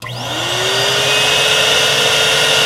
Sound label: VACUUM; Greytsounds; HOUSEHOLD FX